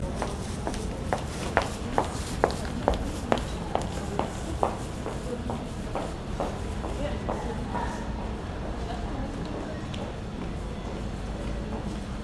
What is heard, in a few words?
Footsteps